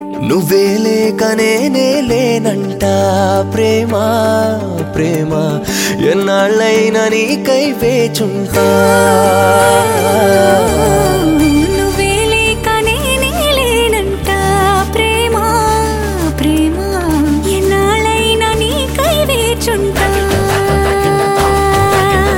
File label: Male Ringtone; Seemaraja